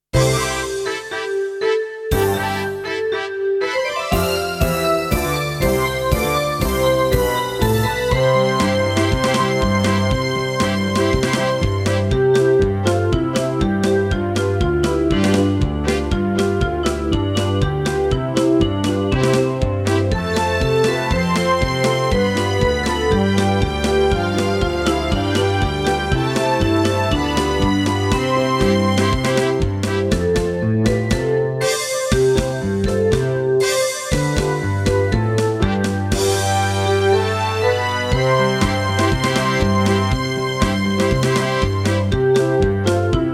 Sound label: Karaoke